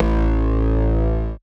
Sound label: Ripper Bass; Big Fish Audio; Old Skool House; OSH Bass